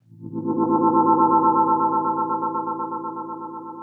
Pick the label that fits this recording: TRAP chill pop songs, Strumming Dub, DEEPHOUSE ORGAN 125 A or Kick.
DEEPHOUSE ORGAN 125 A